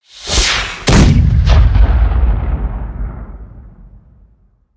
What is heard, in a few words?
spell fireball cast large; explosionen; comedy-cartoon; soundpool